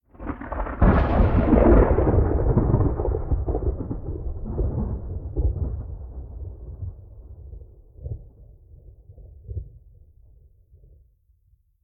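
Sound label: World Exterior Thunder Medium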